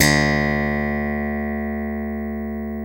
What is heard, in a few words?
FUNK BS D3, slap bass